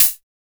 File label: Hat, Drum & Bass